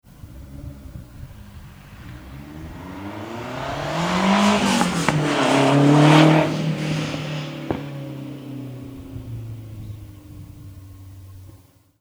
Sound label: Magnaflow, Mufflers; Esprit; Magnaflow Dual-Tip Stainless Steel Muffler (model #14267); New Magnaflow - Hi-Torque Chip plus BOV; Old Flowmaster - S4s Chip